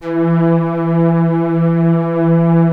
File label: VOY8 STRING, VOYETRA 8; Greytsounds VOL-1